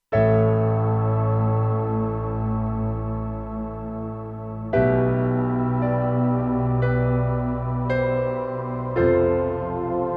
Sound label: St Pno & Vox Pad, Kurzweil K2500X; Factory Programs